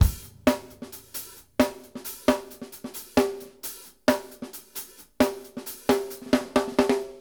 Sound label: hands C, drumworks